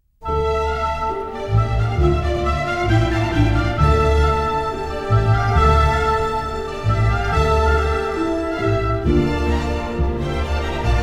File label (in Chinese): 电影音乐; 奥斯卡电影音乐